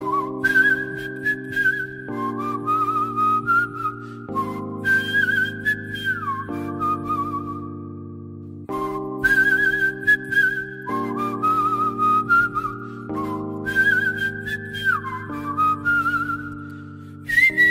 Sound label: Theme Bgm Ringtone; Animal